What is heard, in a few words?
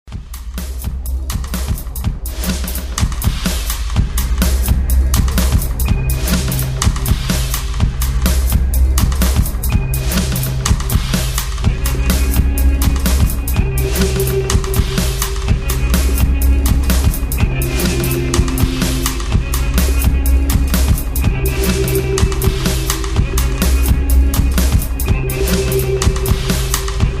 Oriental Flange